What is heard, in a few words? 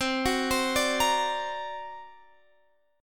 D Augmented 7th C, guitar